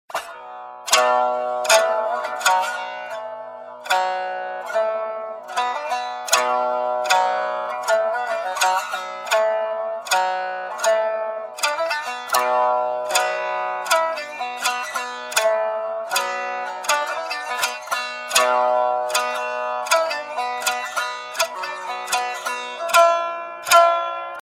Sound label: Shamisen